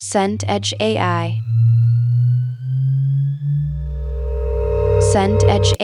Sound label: riser tension